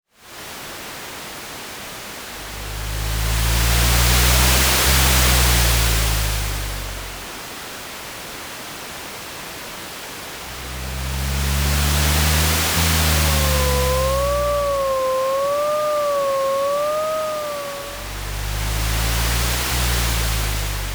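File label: ambience city night distance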